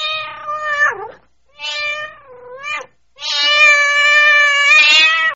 Gato, tono de llamada

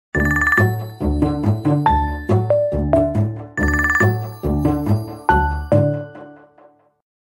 Nokia 9 Pureview, tono de llamada

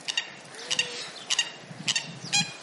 agua pajaro

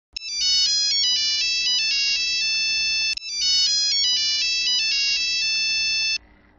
Nokia Antiguos, tono de llamada

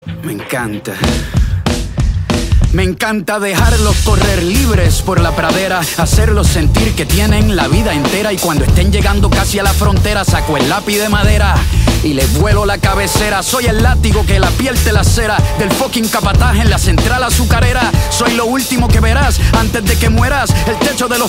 bajo y bateria

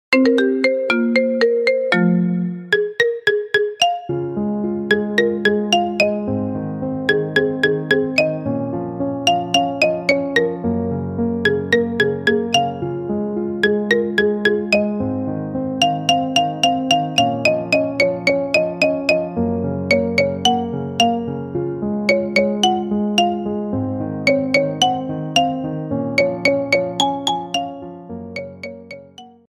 Marimba, tono de llamada

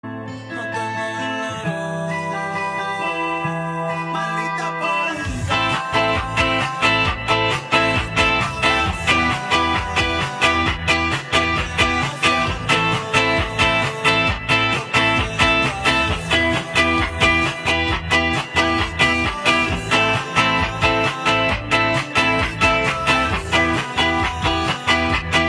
Cover Guitarra

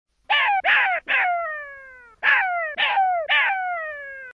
chacal

